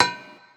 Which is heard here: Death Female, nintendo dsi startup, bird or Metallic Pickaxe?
Metallic Pickaxe